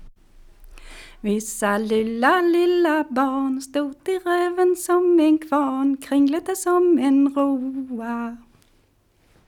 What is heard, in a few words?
Folk music of Småland; Vyssa lulla litet barn, stor i röven som en kvarn